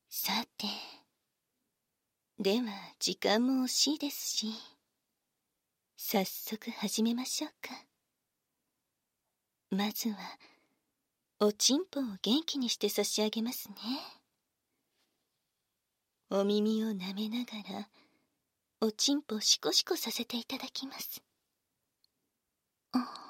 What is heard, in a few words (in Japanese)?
耳舐め手コキ